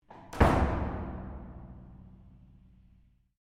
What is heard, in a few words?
Door Heavy Fire Escape Close Echoe Stereo, Heavy weight door to fire escape; Miscellaneous Doors